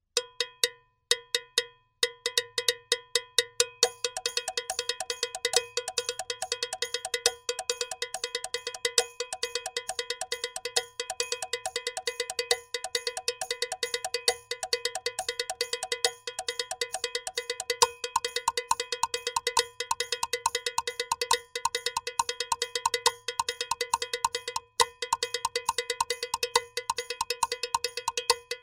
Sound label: body drum